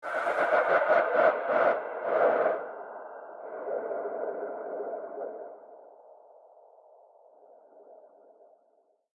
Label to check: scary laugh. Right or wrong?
Right